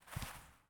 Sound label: Walk; portal quest android; Magic Shrek